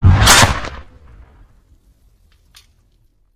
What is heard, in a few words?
new artillery incoming, Cannons